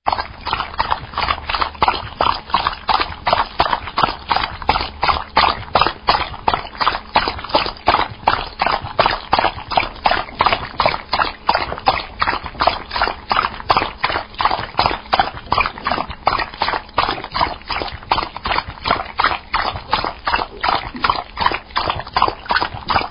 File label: Dog Drinking Water